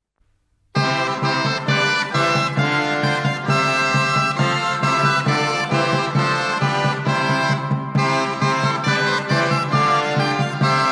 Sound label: rauschpfeife; trois branles doubles